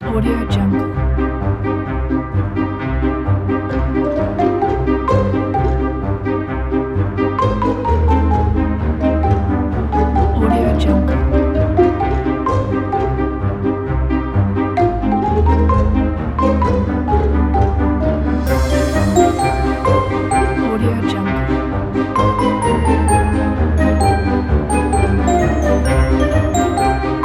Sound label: quirky